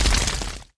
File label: dragon hit; propack